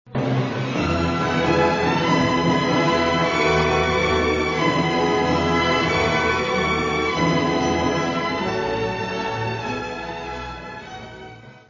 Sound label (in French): majeur, moldau; smetana